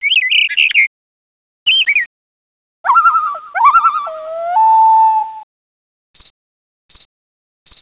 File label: Loon